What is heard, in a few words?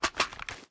ar reload empty fast mag drop mag; weapons; gmod; viper